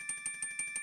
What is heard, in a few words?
Win Count Up Loop; netent